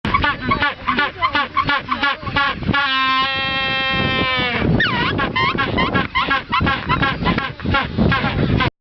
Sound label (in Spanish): El Canto Del Pingüino; Pingüino de Humboldt; Lambayeque